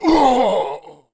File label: fall; monster; barbarian knight